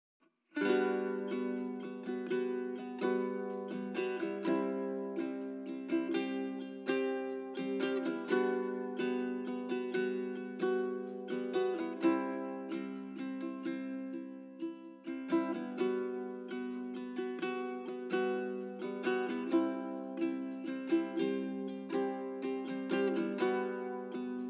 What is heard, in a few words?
Uke